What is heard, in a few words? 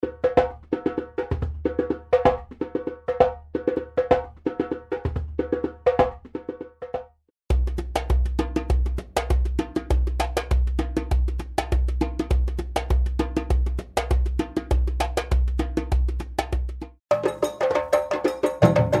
Djembe sound